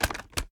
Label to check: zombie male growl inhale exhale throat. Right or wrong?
Wrong